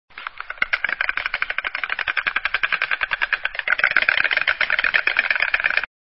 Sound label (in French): cigogne